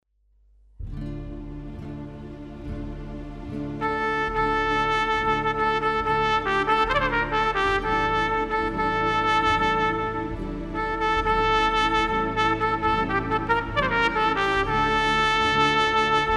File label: Trumpet; El Deguello